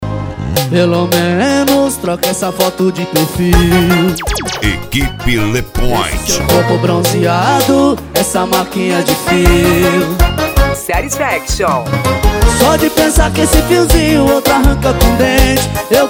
deep house e pisadinha; le point som e acessorios; funk

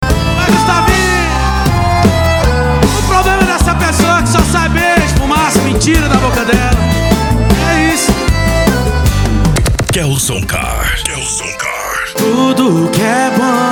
sertanejo e lambadao

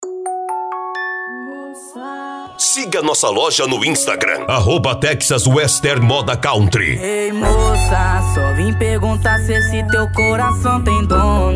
moda country; cd texas western; uberaba mg

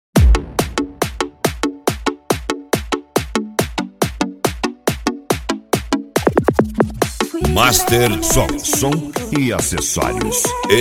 reggaeton

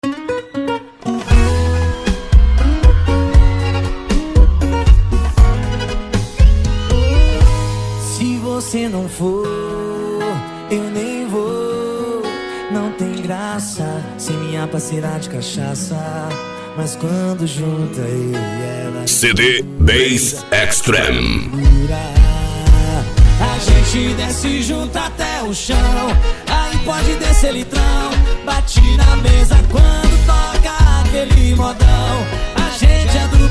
sertanejo; cd bass extreme

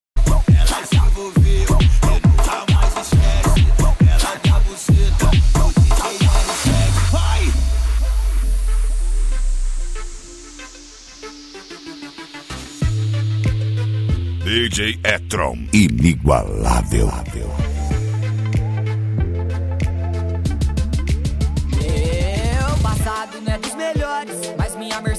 mega funk funk

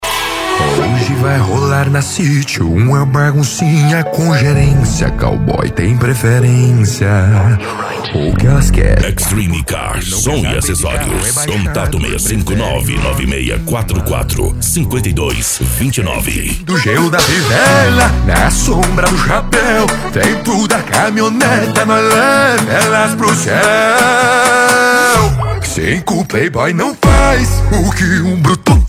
piseiro e sertanejo

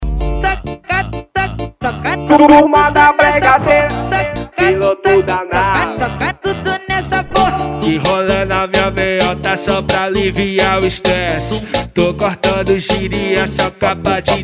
piseiro

